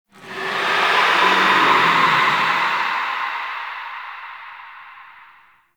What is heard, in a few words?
Sentient Pain Verb; Enemies; Warframe; Tau; Sentient Dactolyst